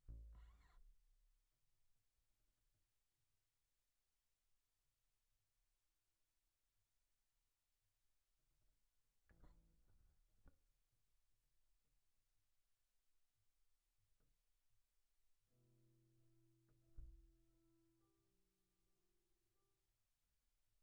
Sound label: Bass DI